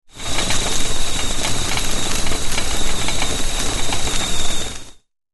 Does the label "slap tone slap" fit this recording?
No